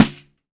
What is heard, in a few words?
PAN, ETHNIC PERC; Roland LA